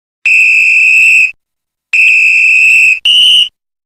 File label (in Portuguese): som apito do juiz